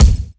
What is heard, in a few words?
studio kickdrum sound c sharp key; Bitdepth 24; kick drum samples